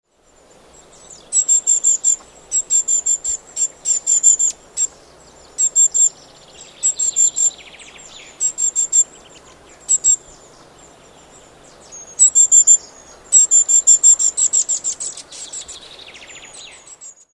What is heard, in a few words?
long tailed tit